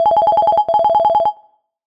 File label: Vintage Ring; Vivo X80-Pro